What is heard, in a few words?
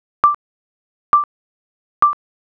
ecg